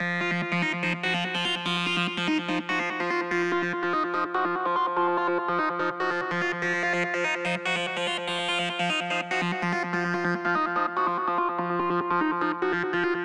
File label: endless series lead line